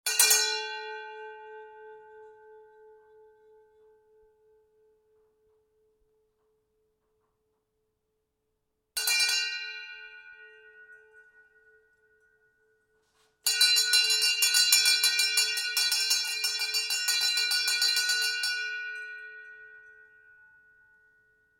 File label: Ships Bell, Brass hand bell rings various